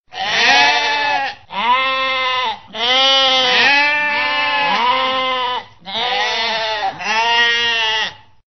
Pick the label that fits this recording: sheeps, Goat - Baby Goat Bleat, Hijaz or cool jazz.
sheeps